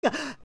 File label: Gasp; Link gasping after a jump; Adult Link